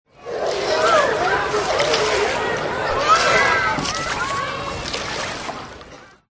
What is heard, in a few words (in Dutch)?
Spetterend water kids met water; ZhenShiXunYouJi2